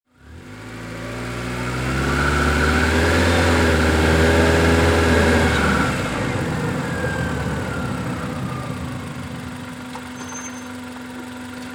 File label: compact car lr parking maneuver